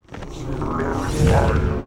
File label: WAR POWERUP; BLAZEBLOCK; Mob